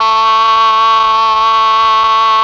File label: OBOE; ILIO; Propeller Island Legendary M400; CHOR+OBOE